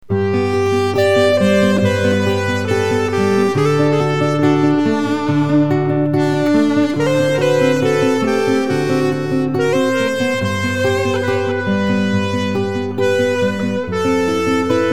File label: winter ewi